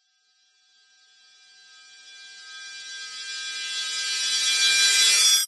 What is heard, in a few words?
riser high pitched harsh ringing fear horror drama; zapsplat